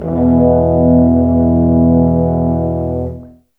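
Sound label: 60 dark, orchestra; East West; Scoring Tools; Dark Side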